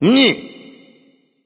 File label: tongue; smite; voicepack; loki